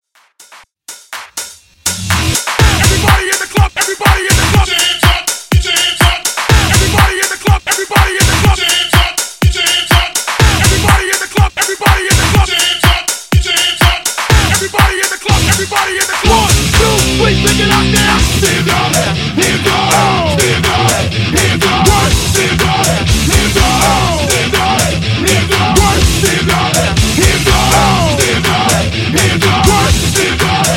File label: Breakz Dj Intro Outro 123 Bpm